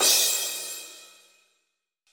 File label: crash cymbal sample e key, Royality free drum crash tuned to the E note. Loudest frequency: 6647Hz; one shot